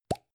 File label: gum plastic box open